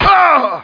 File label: die; CD Action; outlaws